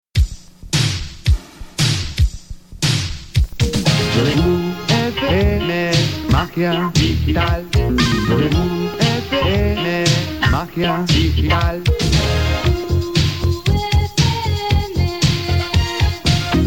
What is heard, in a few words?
Jingle, radio; 1985